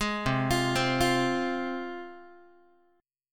C Suspended 4th Sharp 5th C Guitar; arpeggiated; JGuitar

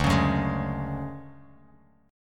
D-Major Sharp 11th, D, Bass Standard; strummed; JGuitar

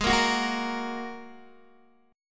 G sharp 7th Suspended 2nd G sharp Guitar DADGAD

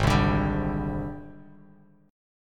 G sharp Major Sharp 11th, Bass Standard; G#M#11 strummed; 4,3,12,12; JGuitar

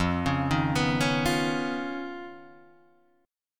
D sharp 7th Suspended 2nd Sharp 5th F

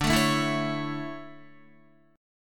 D Major 7th Suspended 2nd, Guitar; strummed; JGuitar

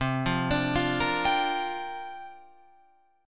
C 9th Suspended 4th, Guitar, Open F; C9sus4 arpeggiated

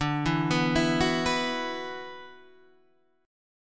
F sharp 7th Suspended 4th Sharp 5th D

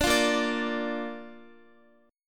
Bb Major Bb down Guitar; strummed

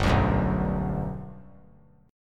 G Major 7th, G, down; strummed; JGuitar

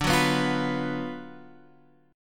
Gb Major 7th Suspended 4th Sharp 5th

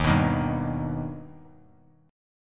D sharp Augmented D sharp Bass Standard; D#+ strummed; 11,14,13,0; JGuitar

